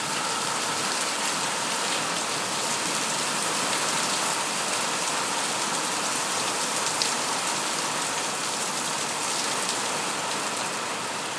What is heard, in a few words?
summer shower